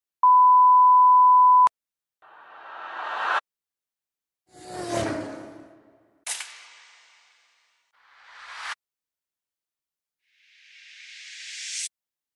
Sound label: production Tool